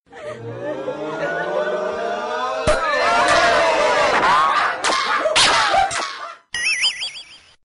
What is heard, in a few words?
happy celebration